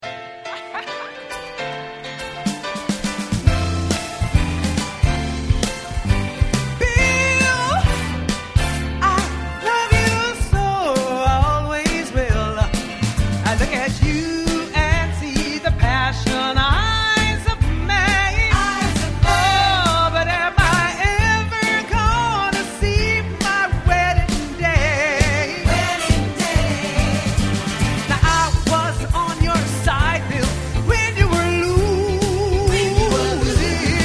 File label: Blues